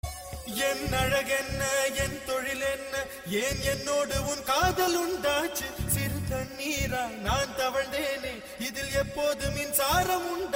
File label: Minsara Kanavu Sad